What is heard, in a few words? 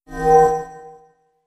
Open Portal